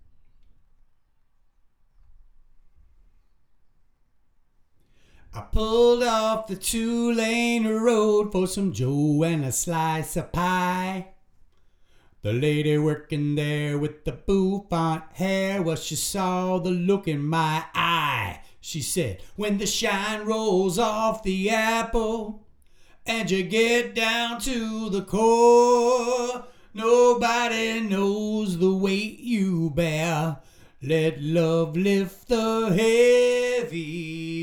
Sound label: vocals